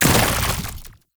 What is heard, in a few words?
Infested Move Turn; Warframe